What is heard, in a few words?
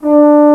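Trombone p D3; Digital Sound Factory Virtuoso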